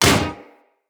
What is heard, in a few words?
Melee Hammer Impact Generic; Weapons; Impacts; Hammers